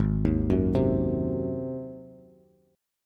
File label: G sharp 7th, Bass; G#7; arpeggiated; JGuitar